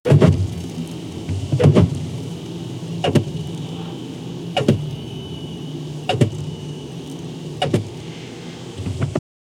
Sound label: rain on a car windshield, windshield wipers